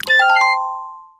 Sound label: item placed in special basket; princess